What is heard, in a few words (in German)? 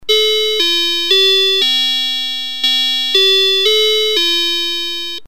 Klang; mistral 600